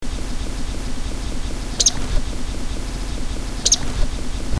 Alpine pika Ochotona alpina; Barig Plateau

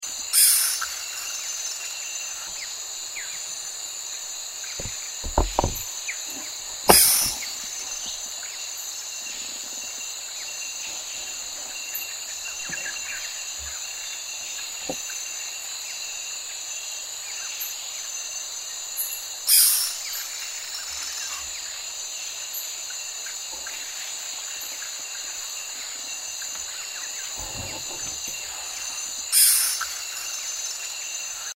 Paraxerus poensis; Sangha; Green Squirrel; Rod Cassidy

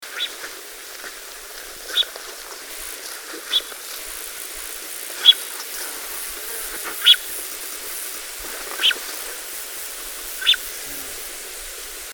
Tarbagan marmot Marmota sibirica; Hustai

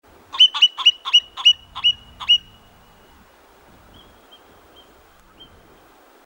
Gray Marmot Marmota baibacina; Kyrgystan

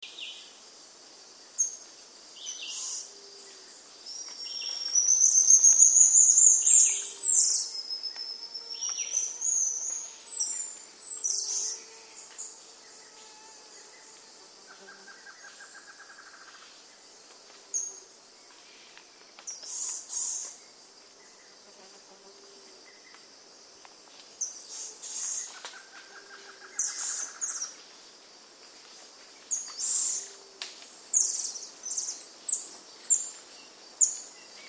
golden lion tamarin; Atlantic rainforest, Brazil

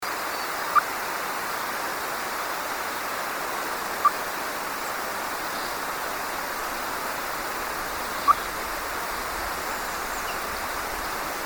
Siberian chipmunk Eutamias sibiricus, Altai

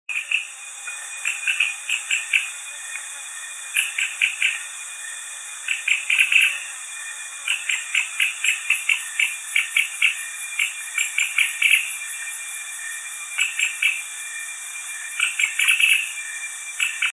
Funisciurus cf lemniscatus; Gabon; Bureau Benjamin

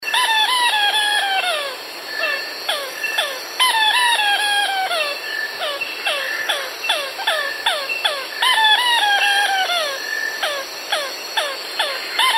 Funisciurus isabella; Sangha; Squirrel Lady Burtons

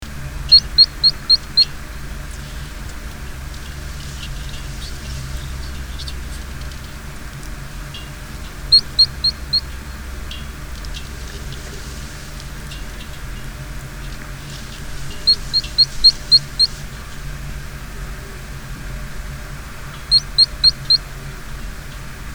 Alashan ground squirrel Spermophilus alashanicus; Gurvan Saykhan

